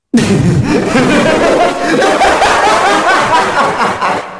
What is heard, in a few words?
hahahahahahahhahahaah